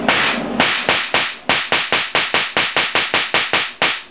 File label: This is a sound clip of our homeade stacked plate capacitor being charg